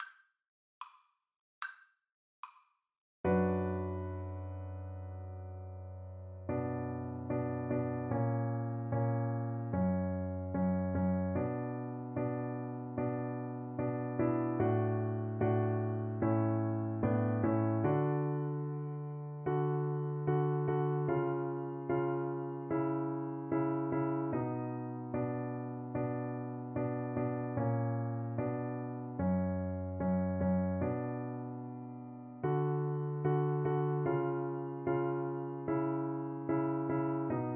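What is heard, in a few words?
VLC karaoke, Cello; beethoven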